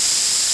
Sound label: spray can loop